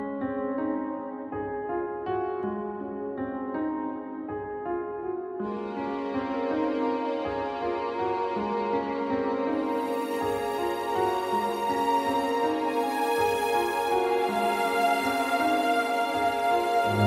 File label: hindi ringtone; bollywood ringtones